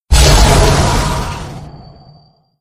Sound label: exit level; Juegos Retro; Gauntlet